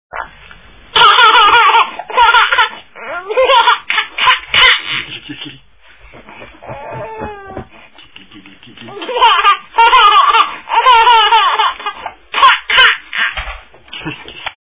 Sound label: laugh cry; (she can do both at the same time when she's tired); December 12: Laughing and crying